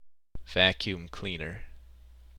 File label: vacuum cleaner